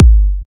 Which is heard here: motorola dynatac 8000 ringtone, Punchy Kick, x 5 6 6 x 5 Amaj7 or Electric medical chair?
Punchy Kick